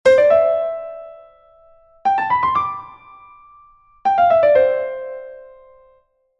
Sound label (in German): schleifer; riemann 1882